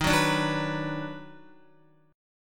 D sharp Minor Major 7th Sharp 5th D sharp down Guitar; D#mM7#5 strummed; 4F,4A,5C,5G,5C,6E